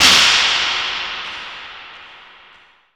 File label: snap, percussive